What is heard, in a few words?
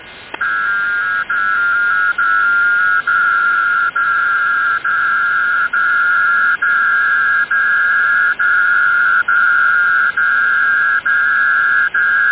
Fake nrs pactor2 9387khz; Fake numbers station, Pactor II part